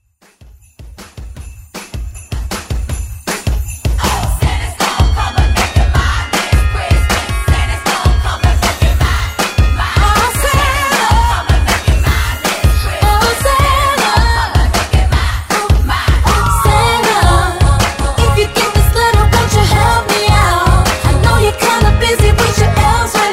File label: Xmas Redrum